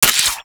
Crossbow Mech, Weapons; Warframe; Tenno; Garuda

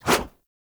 Battle Dog Paw Swipe; Animals; Combat

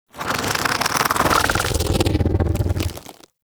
Bug Vocal Idle; Characters; Warframe; Hunting Critters; InfKDriveBug

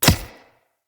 Throwing Knives Impact Wood; Weapon Impacts; Environment

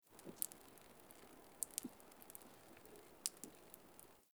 Exterior Water Drip; Ambience; Warframe; Nineteen Ninety Nine

